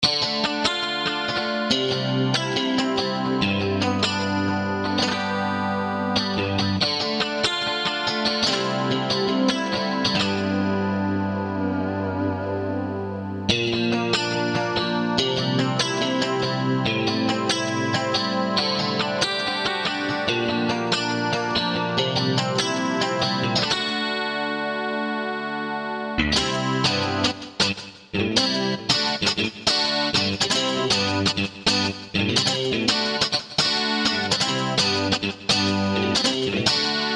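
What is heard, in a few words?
clean rhythm, guitar; Factory Patches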